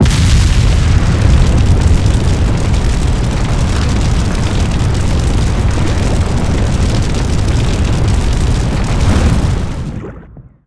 lava explode; Tanks

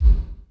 ball bounce; Tanks

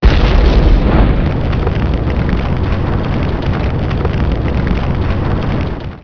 firebolt; Tanks

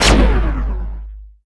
stungun launch; Tanks

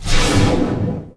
phasemissile launch; Tanks

